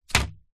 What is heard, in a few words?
foley paper airplane land hit window